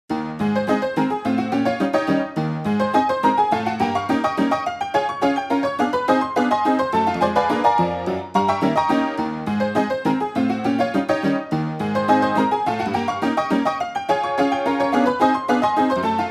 Ragtime Piano; dexibell

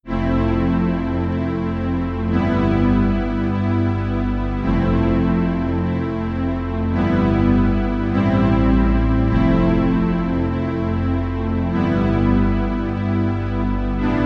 Strings Pad; VIVO H10

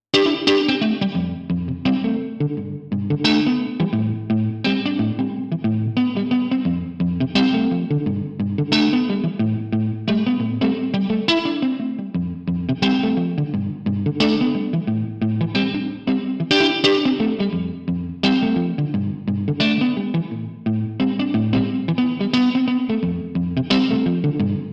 Muted GT

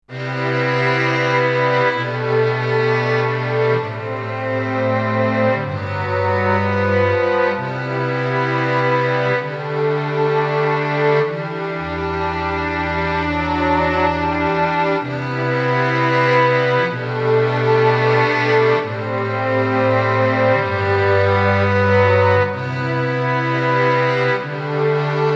Strings Tape; VIVO S10

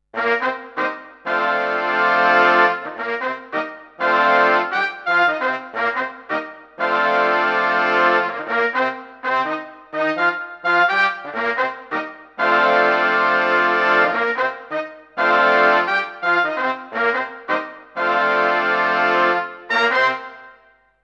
Brass Tape; VIVO S10

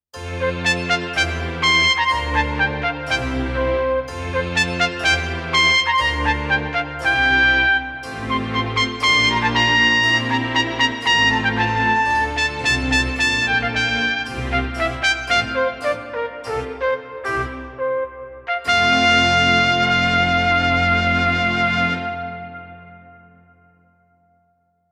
Baroque Trump; VIVO S10

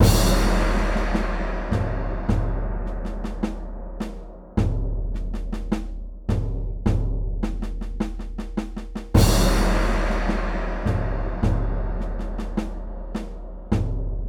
Classic Percussion; VIVO S10

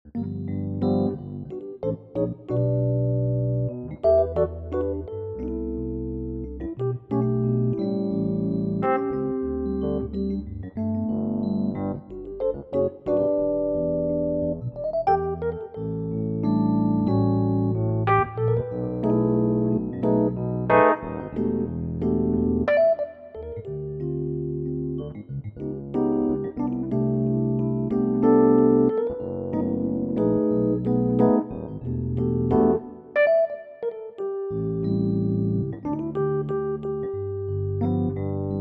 Dyno Stage; VIVO H10

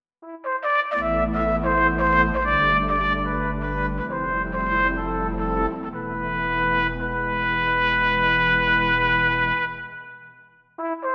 Classic Trumpet

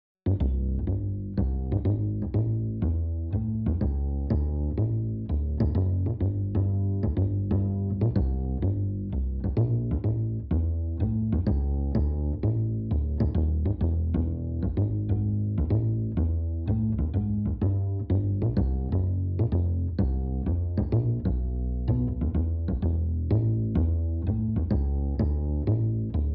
Double Bass; VIVO S10

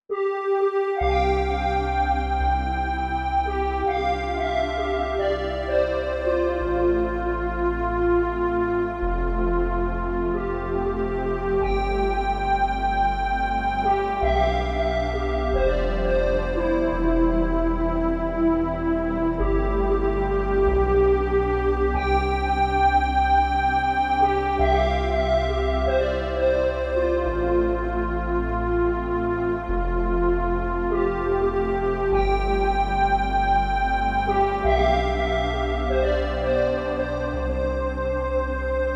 Lyle Lead; VIVO S10

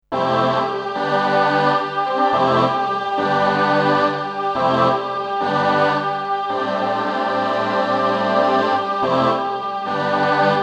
Choir Tape; VIVO S10

